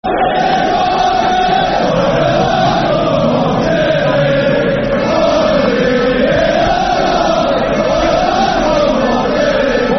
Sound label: When The Mags, Go Marching In Football Chant; Newcastle United Nufc; fanchants-com